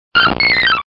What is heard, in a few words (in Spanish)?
Grito de Bellossom